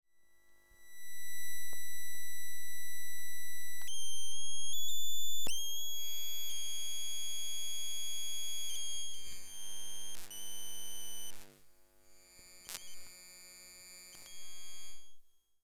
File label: Multiple lamps and cables flowing energy textures